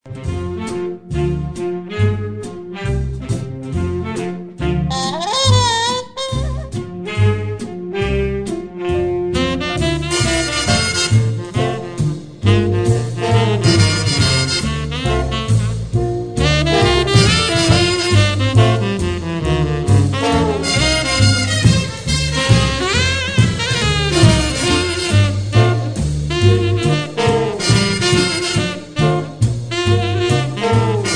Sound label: big band classics